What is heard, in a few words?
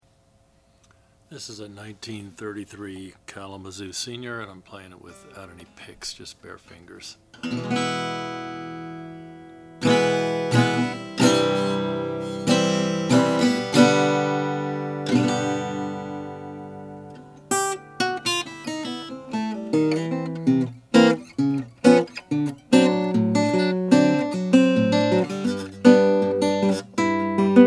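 kalamazoo kg11 senior sunburst, Guitars; 1933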